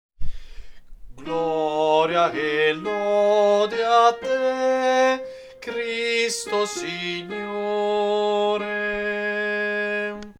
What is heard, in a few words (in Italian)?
Gloria e lode Soprani, coro